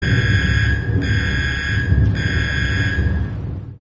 striker sound; Arc of Templar

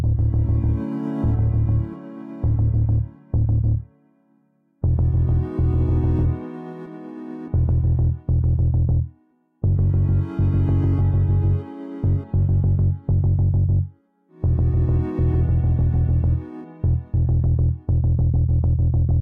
Music Tension

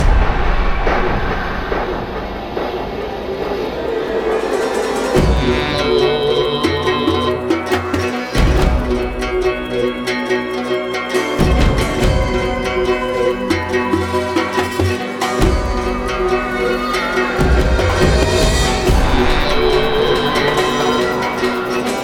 Combat; Skag Gully; Borderlands

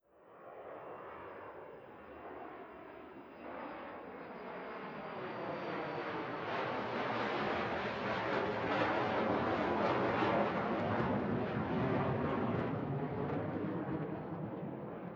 Plane

